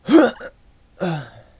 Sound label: death